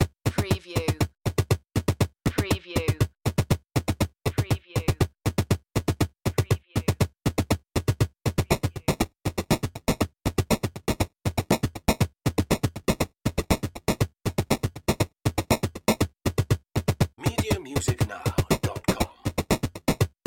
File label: Video Game Music Beat; Sound Effects Bible